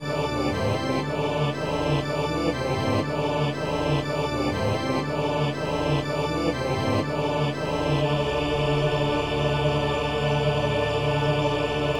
alleluia carillon, basse

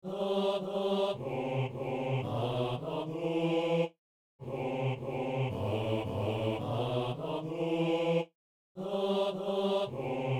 hommes; Saint-Denis; Messe du Frat - Kyrie

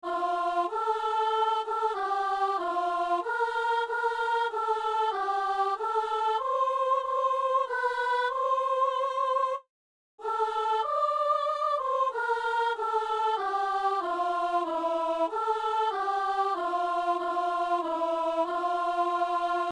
c est toi seigneur le pain rompu, soprano

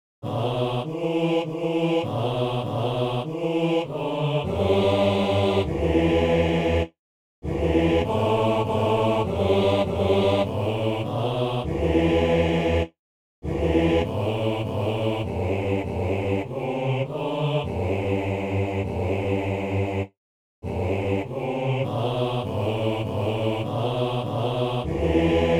basse

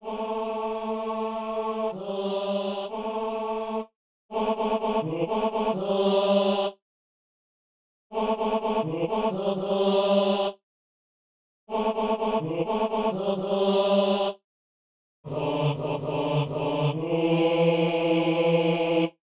basse; Messe de Saint-François-Xavier - Anamnèse

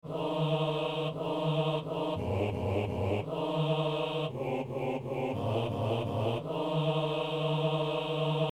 hommes; Psaume 118 (119); Messe du 17 janvier à Saint-Denis

